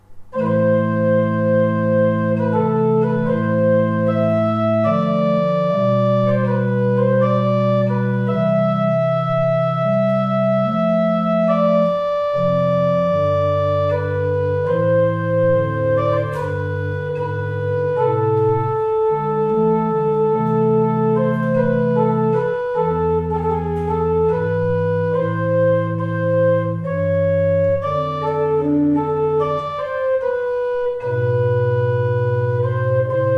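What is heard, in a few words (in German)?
Vierdag 6 I, pfeifenorgeln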